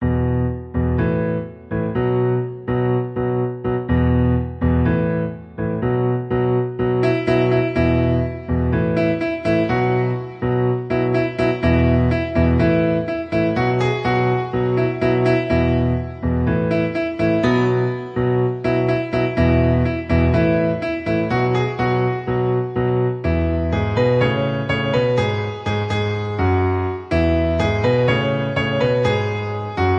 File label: Piano Cover